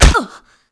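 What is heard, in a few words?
bullet hit kevlar female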